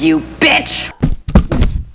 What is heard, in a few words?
death